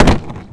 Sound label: land wood; terrains; Tony Hawk's Project 8; XBOX